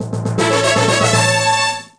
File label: Fan; PS1